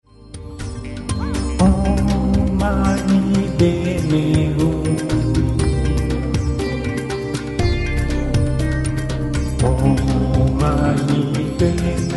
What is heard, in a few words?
mantra Om Mani Padme Hum 120BPM